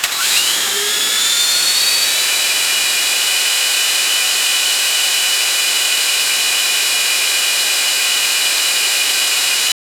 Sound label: 4 1500cc, motor